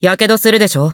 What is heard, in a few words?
Taking Damage (Fire); BBCF; Hibiki Kohaku